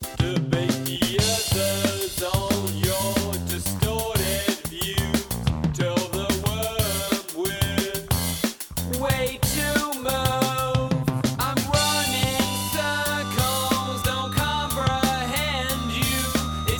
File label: Drum, Before; VoxengoDrumService